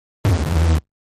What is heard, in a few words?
Distortion Distorted Rip